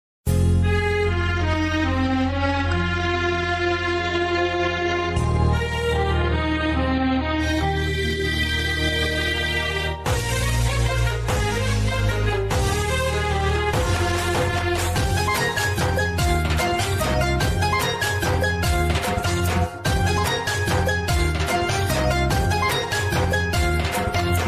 Mappila Album Song Malayalam Vocal Removed HD Karaoke Sample Tra